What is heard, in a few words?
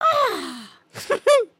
grin, emotes